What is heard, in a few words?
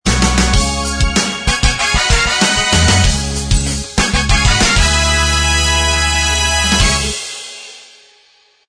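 theme instrumental track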